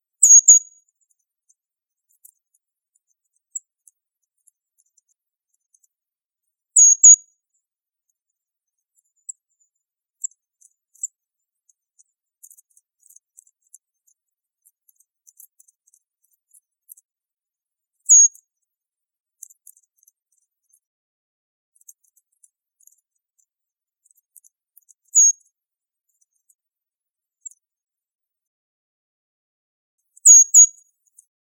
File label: Brown Creeper calls; The distinctive behavior of creeping up the trunks of large trees in a spiral is unlike any other species of bird in North America. It uses the curved bill to probe for inse; Ask A Biologist